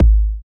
Kick

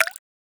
FX Drip; FX Hit; Drums; Ableton Live 12 Lite; Core Library

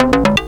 Seq Blip, Synth; Loops; Tonal; Ableton Live 12 Lite; Core Library

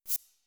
knock; Yahoo! Messenger

